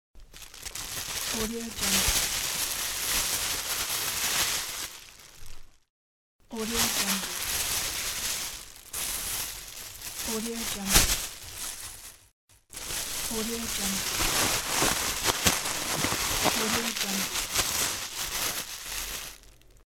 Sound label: crunchy plastic bag noise